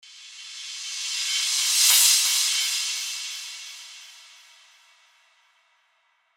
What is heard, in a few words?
suspense sound; gorilla tag youtubers